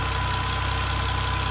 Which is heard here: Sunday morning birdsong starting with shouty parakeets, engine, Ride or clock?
engine